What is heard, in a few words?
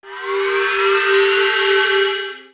sfx sci fi; Masterbits - Switch FX